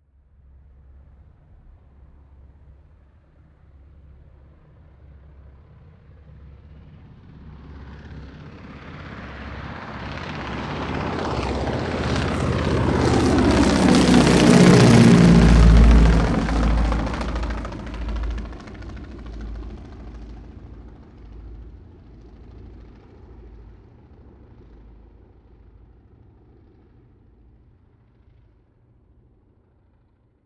propeller plane ju52 fly by overhead short

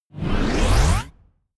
wind up

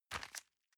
envelope grab medium

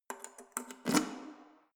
letterbox metal insert key

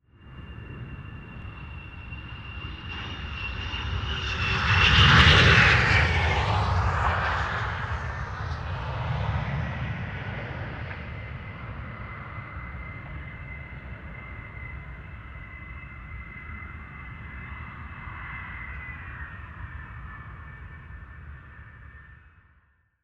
jet landing

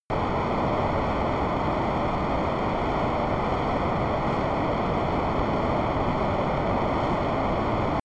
military jet f-22 raptor turbine steady distant

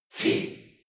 choir voice male whisper

